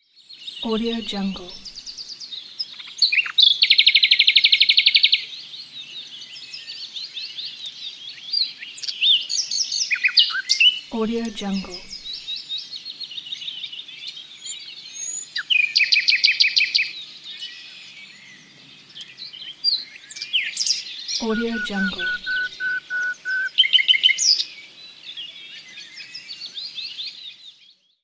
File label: birds